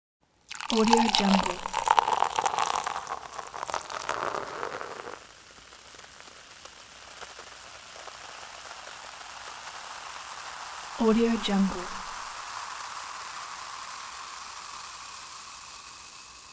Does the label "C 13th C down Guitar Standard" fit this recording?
No